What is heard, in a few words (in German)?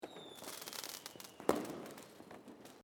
Silvester Atmosphaere